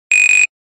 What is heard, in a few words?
Buzzer; Nokia; C2-02